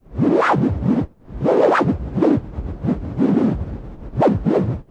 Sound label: noise Modular, Noise Sweeps